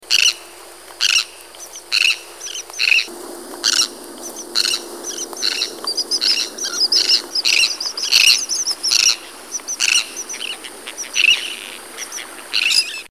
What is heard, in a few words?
Sandwich Tern, songs